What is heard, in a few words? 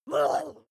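witch groan short, creatures; kreaturen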